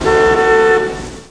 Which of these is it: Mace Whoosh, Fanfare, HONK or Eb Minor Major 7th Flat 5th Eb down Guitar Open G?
HONK